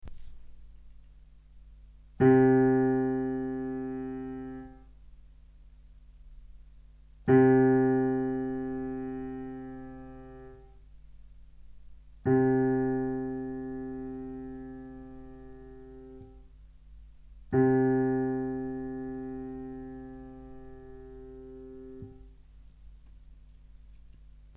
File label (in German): Stimmton C 440; Play-Along für Instrumentalist innen